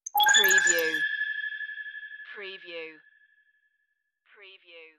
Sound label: space message alert